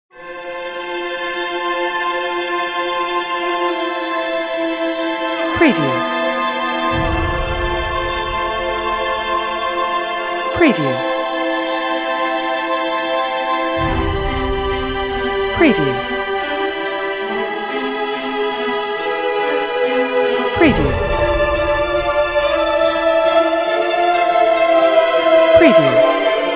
Cinematic Historical Storytelling Film Score Female Voice